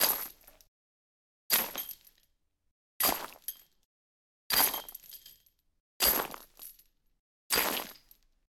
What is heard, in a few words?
zombie footsteps; michael; DeadFright